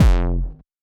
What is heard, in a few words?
BD, DRUMS; Den Haag Hakke; Polyend; Legowelt